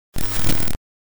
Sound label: Bad Signal